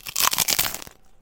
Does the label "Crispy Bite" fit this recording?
Yes